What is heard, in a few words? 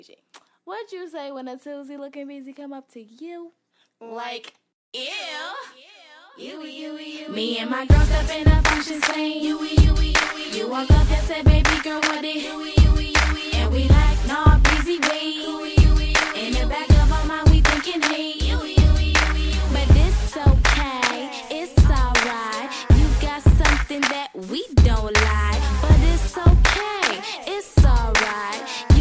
Ewww